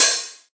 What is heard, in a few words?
trebly open hat one shot c key, Royality free open hat tuned to the C note